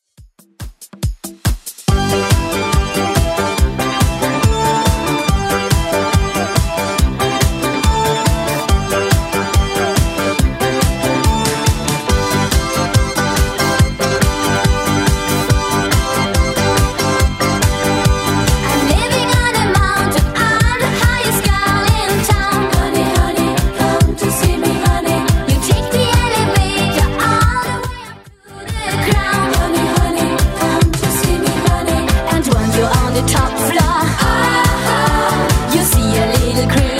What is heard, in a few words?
70s Redrum 141bpm